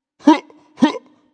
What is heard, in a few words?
Vocal Deep Huh Huh